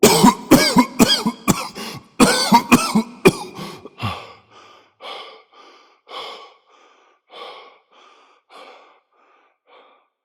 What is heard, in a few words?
Cough; Warframe; Entrati Lab Quest; 2023 TennoCon; Arthur